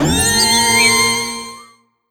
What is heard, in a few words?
Skill Spell; Imja